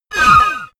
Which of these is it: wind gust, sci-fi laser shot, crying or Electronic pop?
sci-fi laser shot